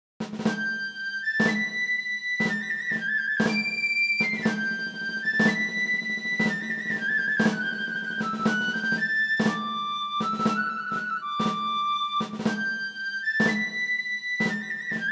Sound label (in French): marche